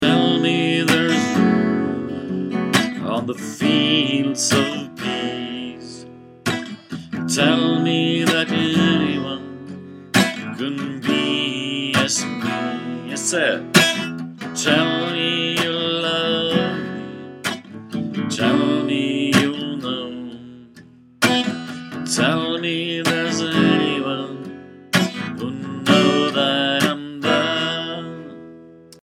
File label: fields of peace melodi